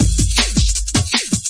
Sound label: 159 bpm funky plaid splash, drum loops and breaks; 141 to 160 bpm